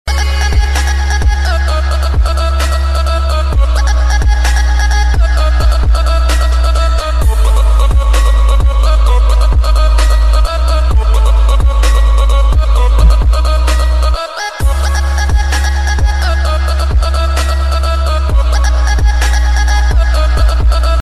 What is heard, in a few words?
ooooo ooooo bass ringtone